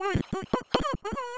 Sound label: surprise strong; VIVAE; vocaltrax